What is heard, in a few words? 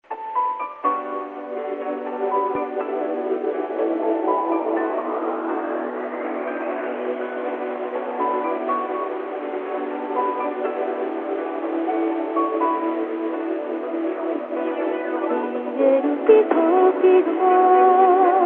music; キャリア別通話音質; 衛星携帯